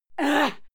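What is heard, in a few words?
female fight attack hit soft, screams; schreie; menschen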